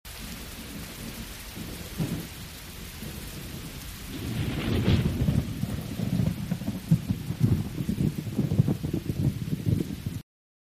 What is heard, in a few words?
storm gathering; Celtic Sounds Of Middle Earth 2